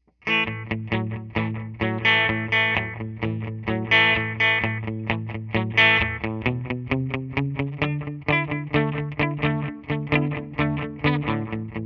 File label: Delay Slapback; GFS Effects